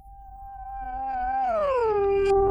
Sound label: fret slide, Pedulla bass; Masterbits CLIMAX 7 - Bass